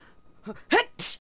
Sneeze; Adult Link

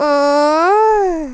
"Ohhh"; Beth: "Ohhh...!"

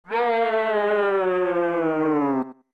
Four Giants Shout

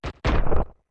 Goron Curl; Goron Link curling into a ball

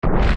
Swell; Barinade; zelda; OOT

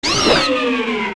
Tektite Die; OOT

